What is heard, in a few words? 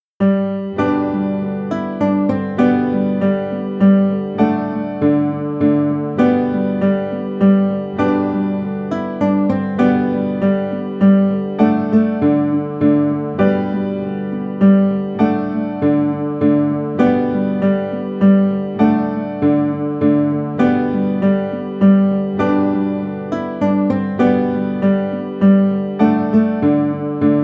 The More We Get Together Guitar